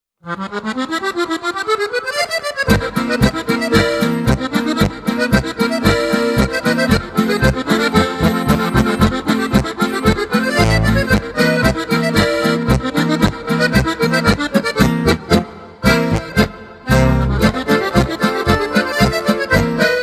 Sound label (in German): Akkordeon, Polka